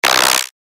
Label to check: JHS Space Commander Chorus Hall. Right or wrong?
Wrong